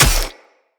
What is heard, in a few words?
Short Sword Medium Impact Flesh; Weapon Impacts; Player; Swords; Combat